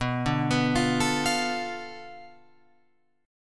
B Minor 7th Flat 5th B, Guitar Open F; Bm7b5; arpeggiated; JGuitar

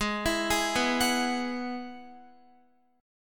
Ab Minor 7th Sharp 5th, Guitar; Abm7#5; arpeggiated; x,11,14,13,11,13

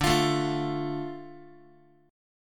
D Minor D Guitar; Dm strummed; JGuitar

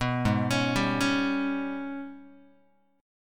Ab Minor Major 11th, Guitar; arpeggiated; 4A,4E,5A,5D,5G#,6C#; 2,4,4,5,5,x; JGuitar

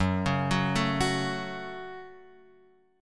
Gb Minor, Guitar, Open F; arpeggiated; JGuitar

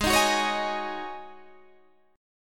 Ab 7th Suspended 4th Ab

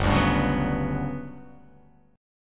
G Major Sharp 11th G down, chord; strummed; JGuitar

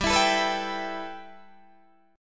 G 6th Add 9th, Guitar, Open D

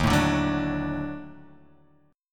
Eb Minor Major 7th Flat 5th Eb down; EbmM7b5; strummed; JGuitar

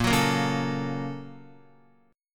Bb Minor Major 7th Sharp 5th, Guitar; BbmM7#5; strummed; JGuitar

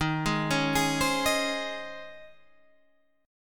Db Major 7th Suspended 2nd Eb

